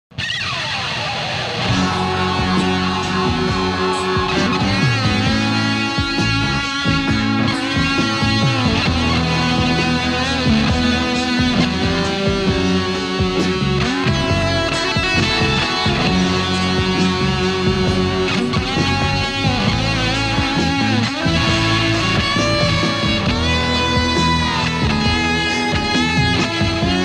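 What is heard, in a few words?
Solo, guitar; PhilPhuzz; Reeves Eclipse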